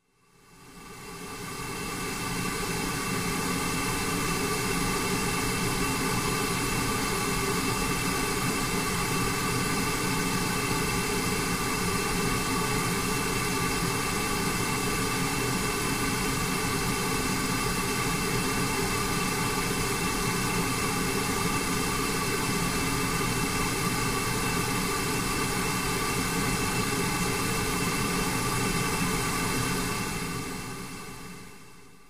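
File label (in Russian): горение газовой плиты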